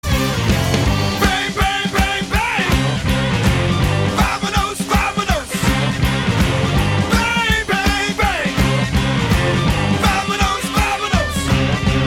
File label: win, music